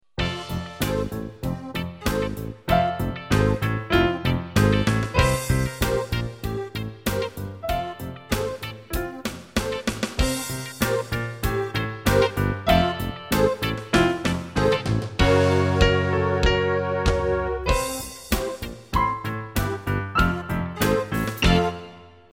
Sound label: Clown Show Shuffle